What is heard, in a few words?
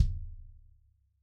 bass drum